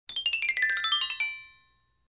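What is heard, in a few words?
ксилофон end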